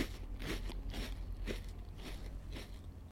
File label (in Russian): zvuki vaflei